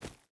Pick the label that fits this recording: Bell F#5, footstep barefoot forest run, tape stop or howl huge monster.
footstep barefoot forest run